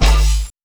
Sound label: Honk F, bass